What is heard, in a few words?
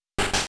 Entering Door; Pokemon Essentials; Pokemon Opalo